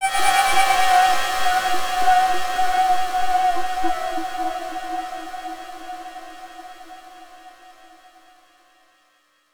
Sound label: Sting; Stronghold